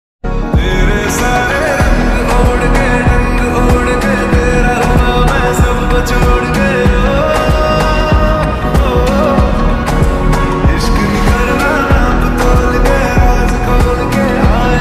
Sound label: slowed reverb ringtone romantic lo fi ringtone